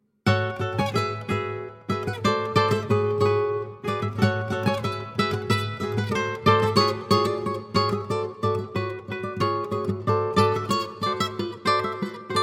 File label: TRIANA, Suite Iberia